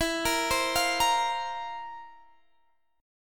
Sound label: F Major 7th Suspended 4th E